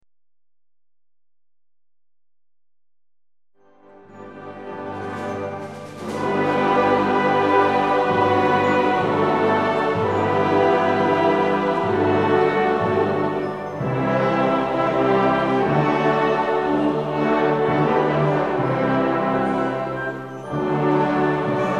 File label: hymn